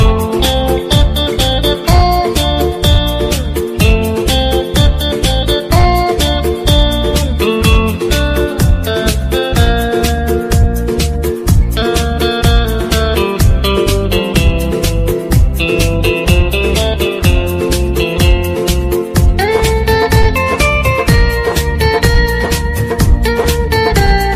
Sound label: Love Music Ringtone